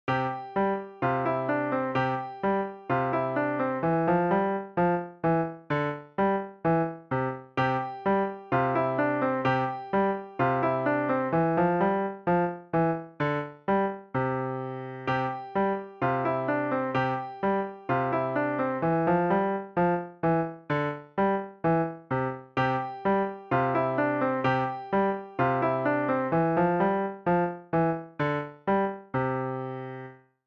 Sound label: musette easy